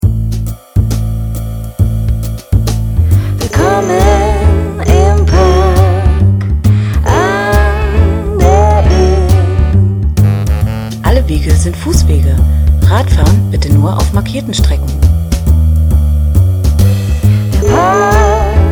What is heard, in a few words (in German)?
Jingle